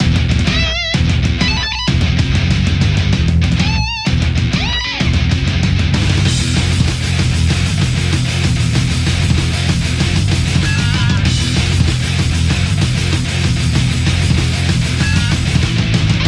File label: heaviest metal, ringtones; Google; Google Sounds; Play It Loud